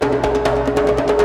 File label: drum trip